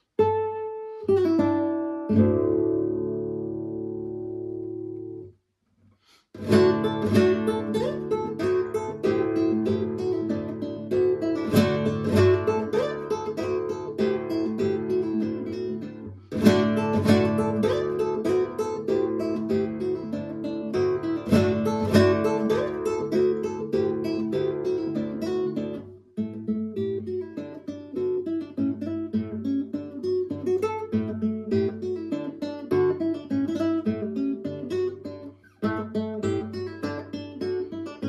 dance bumblebee easy arrangement of a polish folk song trzmiel, guitar; tanec shmel